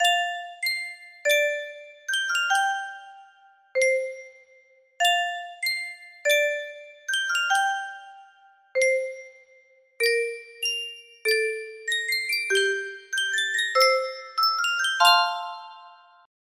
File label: music box; dumbo